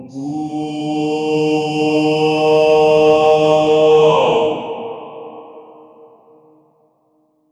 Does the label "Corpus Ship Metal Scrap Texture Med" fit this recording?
No